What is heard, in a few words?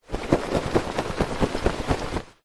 foley clothing suit trousers pants shake